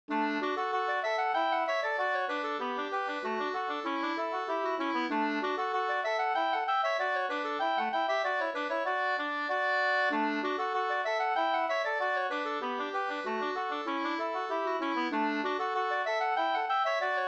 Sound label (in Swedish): engelska